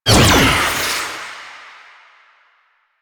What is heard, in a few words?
Stalker Jade Scythe Proj Whizz; Warframe